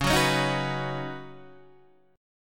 C sharp 7th Suspended 2nd Suspended 4th, C sharp, down, Guitar, Open D